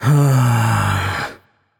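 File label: nonverbal sigh; voicepack; smite; merlin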